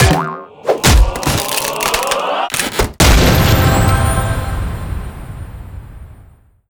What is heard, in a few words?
item crate smash ultrarare